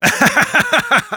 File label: Sniper laugh happy, de